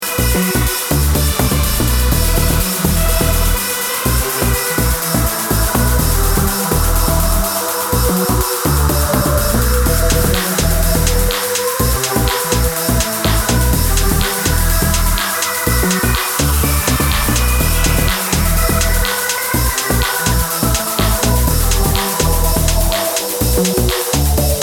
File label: broken cymbal